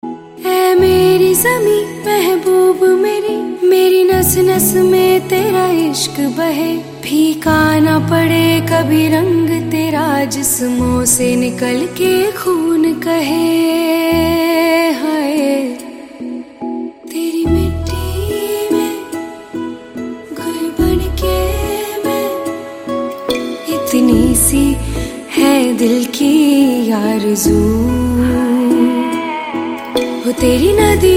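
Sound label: Female Version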